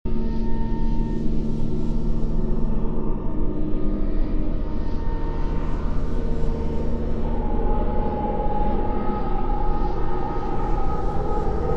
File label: Sinister Ambience; Ezra The Stranger; By Grach